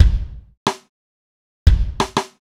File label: kick snare